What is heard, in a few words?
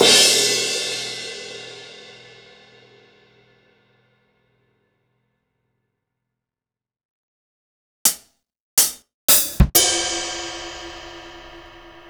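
Akai MPC500 Bedroom kit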